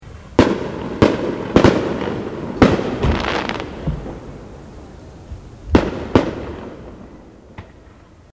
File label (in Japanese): 打ち上げ花火